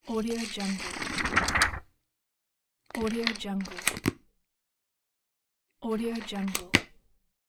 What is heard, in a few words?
flicking pages